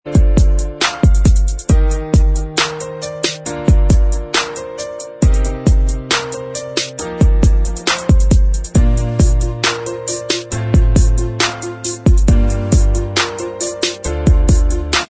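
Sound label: instrumental, backing track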